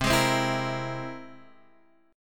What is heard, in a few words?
C Augmented 7th, Guitar, Half Step Down